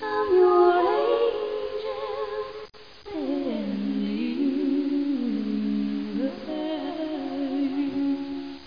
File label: open; Heaven